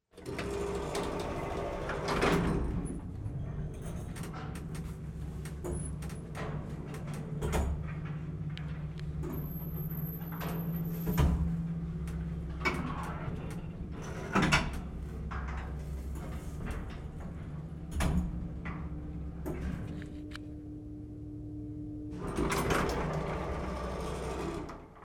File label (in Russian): zvuk lifta